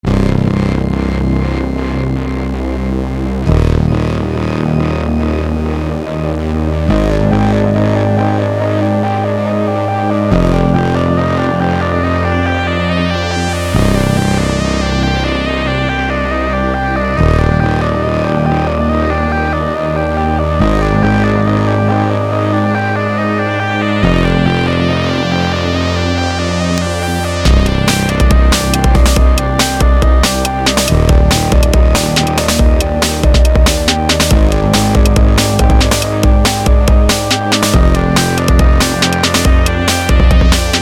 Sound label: Elektron Sid Station, chipmusic